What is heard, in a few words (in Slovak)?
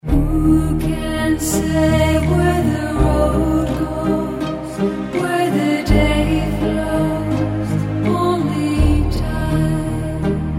pesničky